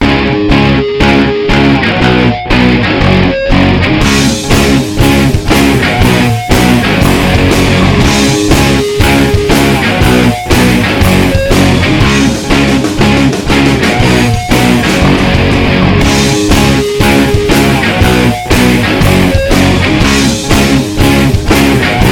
estrumental punk

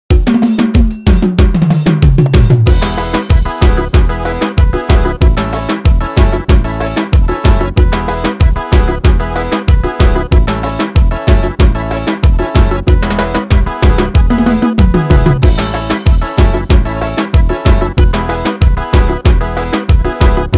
forro com pisada

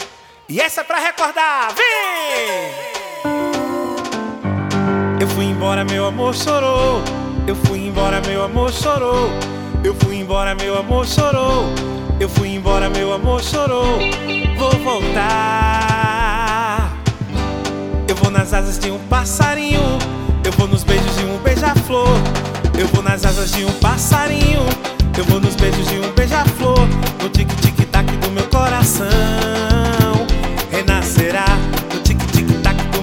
show ao vivo axé pout pourri; 30 anos do axé

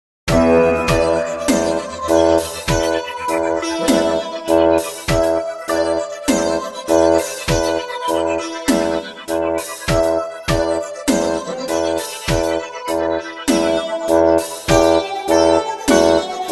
eletro instrumental

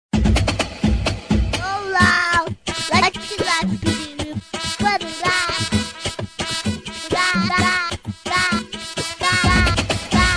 funk

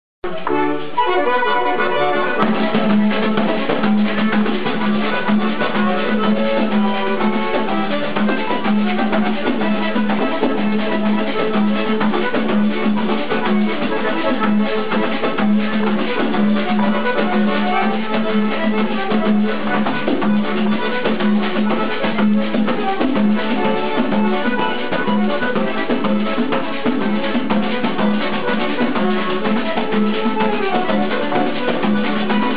acordeon

